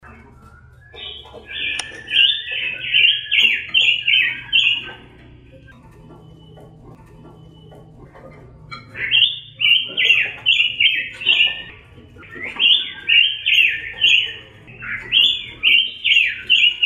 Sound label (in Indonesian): robin pancawarna